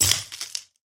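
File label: dry crack of broken glass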